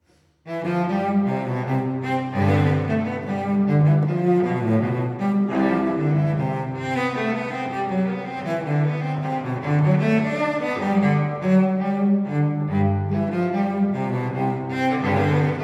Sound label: Suite in C Major, Bourrées; Suite No. 3; BWV 1009